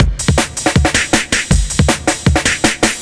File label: drums, Hardcore Breakbeat; Big Fish Audio; Hardcore Breakbeat Action